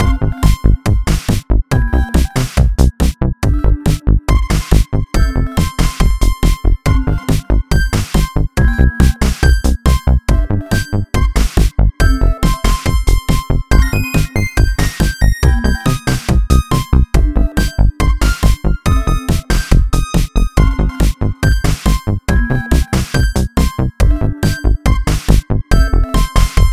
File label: background music loop